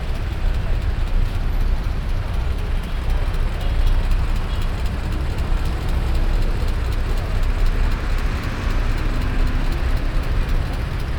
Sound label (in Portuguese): balo de coco aceita passe, Brasilien